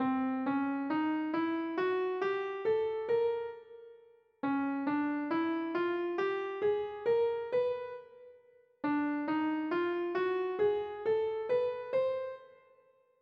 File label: half whole dimin